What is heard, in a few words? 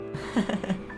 hehehe